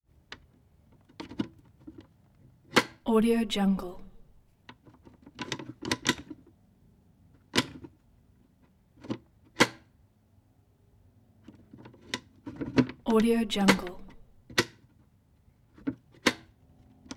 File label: deadbolt dull metal slide fumbling and handling